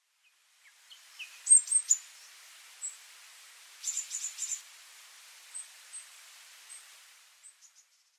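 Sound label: Okinawa Japanese Tit; kunigami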